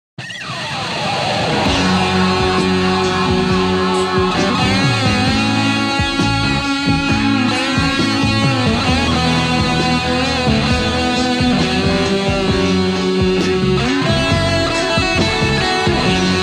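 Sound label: Solo Cornish P-1 sustain maxed; SSL5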